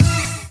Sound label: Synth Sound; Vengeance Samples; VEE Synth Shots